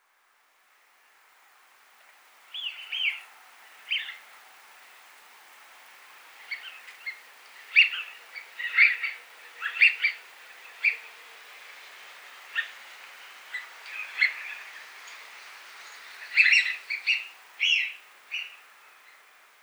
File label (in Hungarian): fütyülő réce hangja